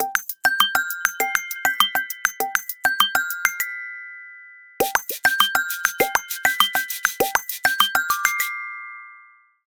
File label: Dish hop